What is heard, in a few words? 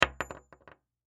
Bullet Shell; Bullet Shell 45 Drop Wood; Drops (Sound Effects:Objects); Blastwave; Warfare